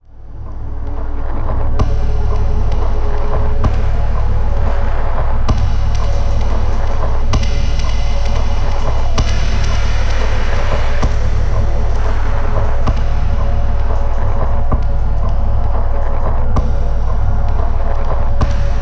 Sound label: Fractured World; Satellite Hive